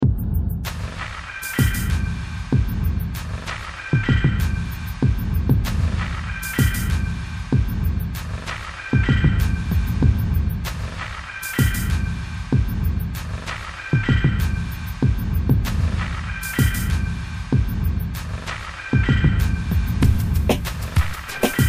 Drum kit, Synthetic Drums; NI

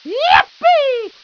yippee; cannonhills

